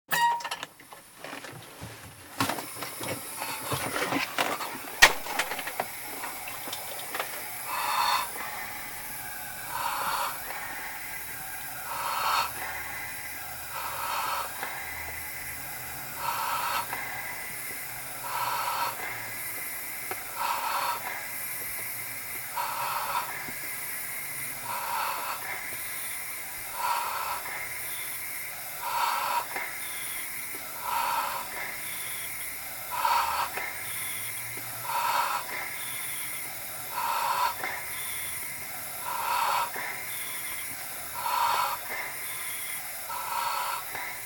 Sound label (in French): Masque à oxygene, bruitage